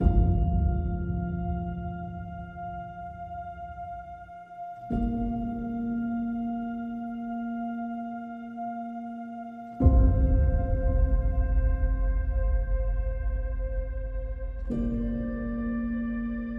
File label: Piano Guitars and SFX Only